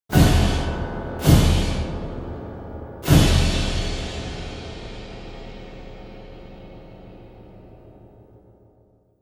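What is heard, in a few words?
scene percussion; Myst III Exile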